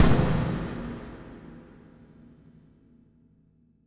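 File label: FX kit bass drum, drums acoustic; USB Soundscan vol.10; AKAI; 90s sample CD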